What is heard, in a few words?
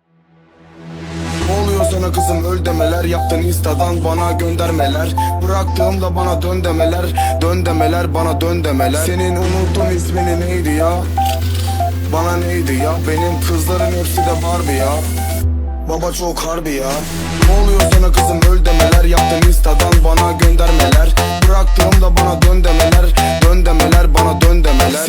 beat 95